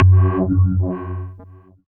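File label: synth power, MicroWave; Sound & Vision - Gigapack I CD 2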